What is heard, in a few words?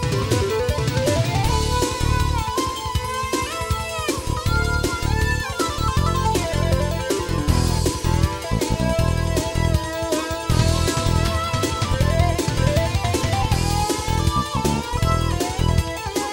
solo